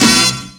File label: Horn Hit; Drum N Bass; Misc; Sonic Foundry (Sony Creative Software); Knutrix Drum & Bass